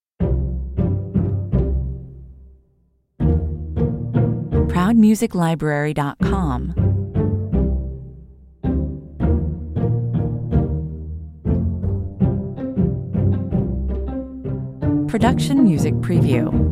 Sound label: pizzicato drive 160 bpm